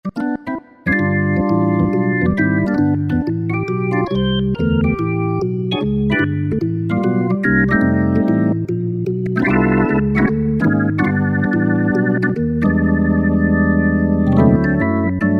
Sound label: Or Jimmy Perc, Yamaha EX5R; Factory presets